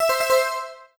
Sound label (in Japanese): piroriro